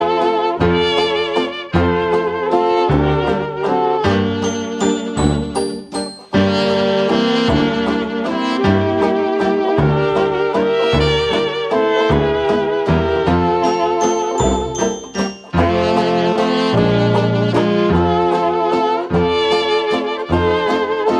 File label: Jazz Orchestra Waltz Medley